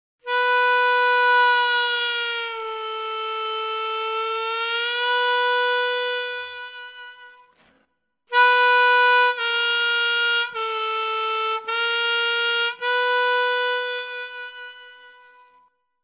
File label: bend, harmonica